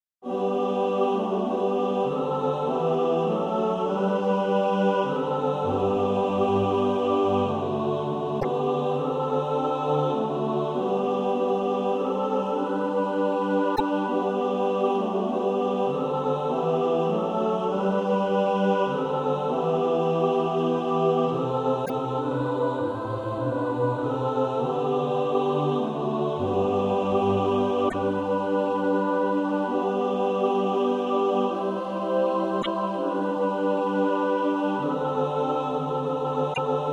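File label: mixed, Practice Music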